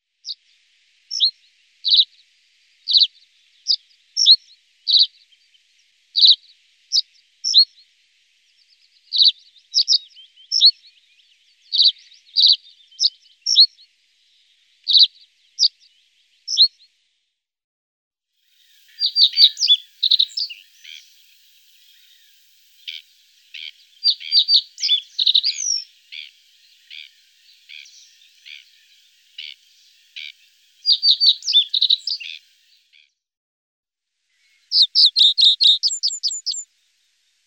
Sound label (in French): bruant des roseaux